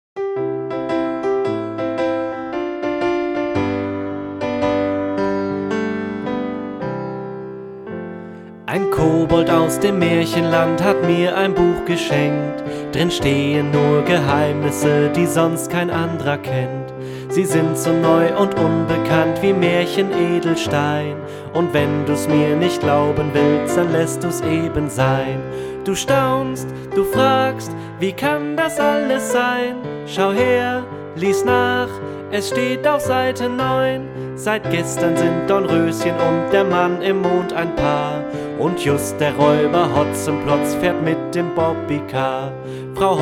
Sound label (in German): Chor